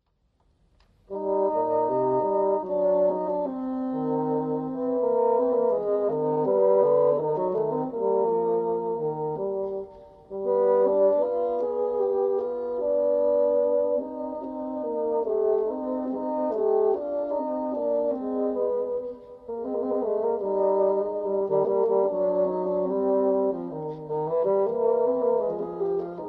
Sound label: duet